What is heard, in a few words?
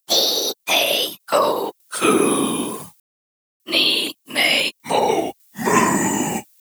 PWMG Melody